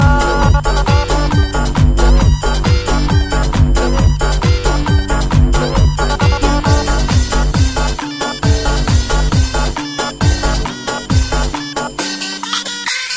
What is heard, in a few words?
Classic Remix